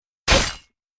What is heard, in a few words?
kill knife